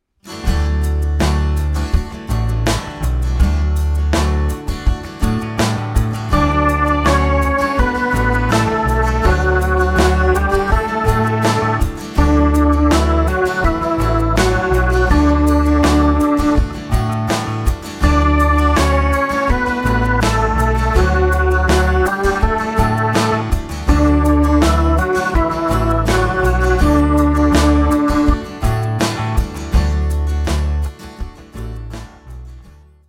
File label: melodic dictation